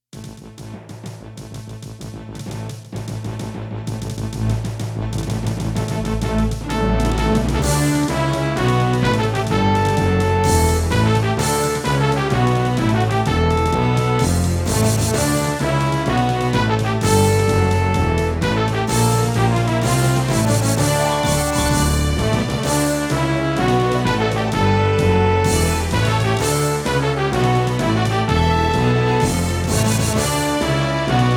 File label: Roland SC-55mkII